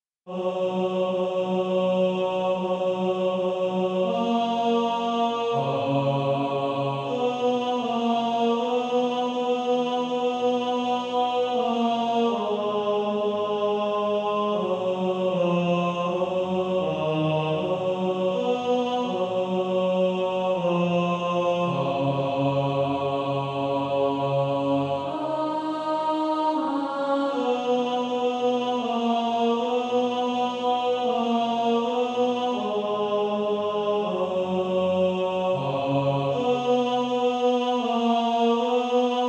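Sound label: Male Choir, tenor 2; TTBB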